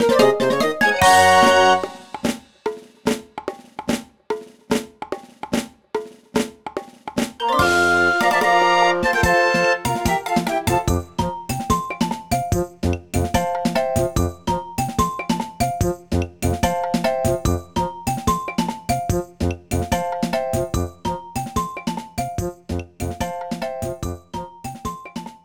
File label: Success; Desert Island Escape